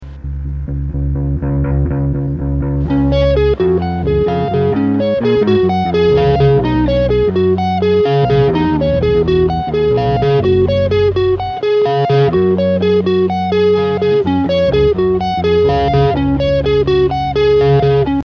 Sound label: rock